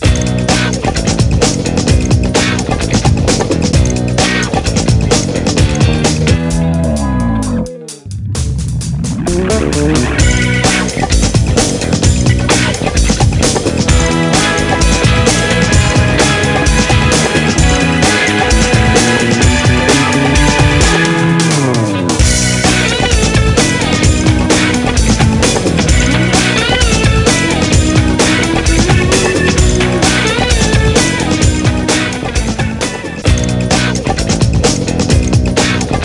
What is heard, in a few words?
Victory Music; Daring Game for Girls; nds